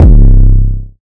808 - C, trap; 808s; Blasted 808; Cymatics; Trap Starter Pack

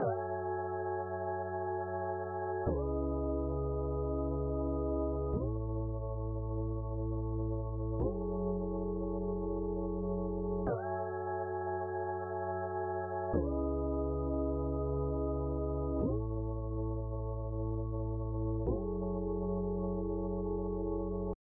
90 BPM C# Min Sine Pad; Loop Stems; Cymatics; Violet

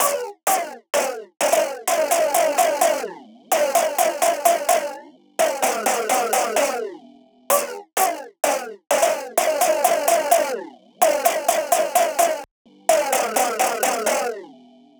128 BPM E Min Synth; House; Drop Loops; Loop Stems; Cymatics; Volt

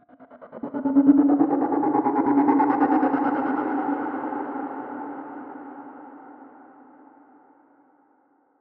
Atonal Depths 138 BPM; Psytrance; Atonal Depths Of The Mind; Ghosthack; Psytrance Visions Volume 2

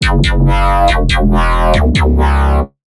Dirty Bass F# 140, Bass Hits; One-Shots; Fractal; Ghosthack; Color Bass Essentials

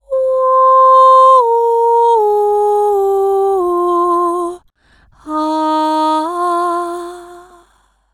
Oh Intro Vocals - Fm 80Bpm; Construction Kits; Ghosthack; SkyRealm; Kit 08 - Into The Light

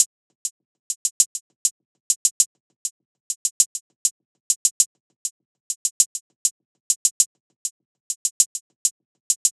Hihat Loop 100 BPM; Tavern; Hip Hop

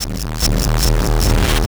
Distort

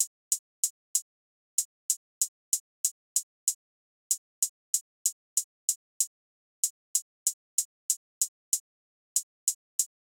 Drum Loop - 95 BPM Hihat, West Coast; Loop Stems; Cymatics; GHOST (Beta Pack); Alameda

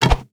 Attack Bow; Game Essentials

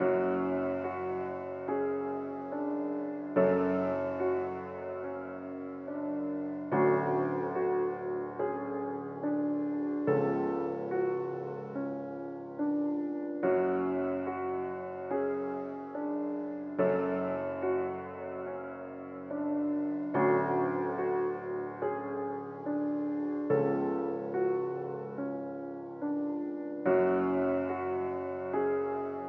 143 BPM F# Min Piano; Melody; Trap; Loop Stems; Cymatics; 2022 Melody Collection; Streets

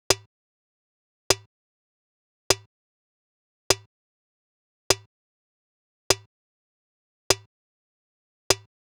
Drum Loop - 100 BPM Percussion, Afrobeats; Loop Stems; Cymatics; PARADOX II - Drum Loop Collection; Duppy Drum Loop

